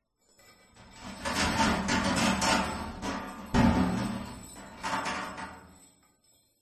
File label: prison door metal rattle open or close jingle of keys chains